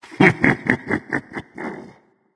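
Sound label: nonverbal heh heh; smite; voicepack; merlin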